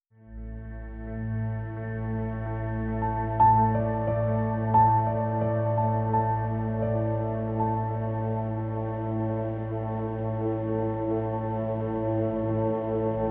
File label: forest mix cut